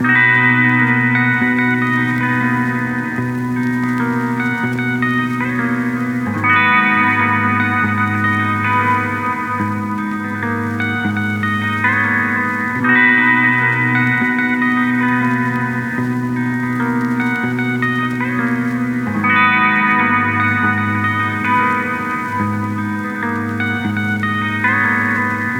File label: coastline; battl beats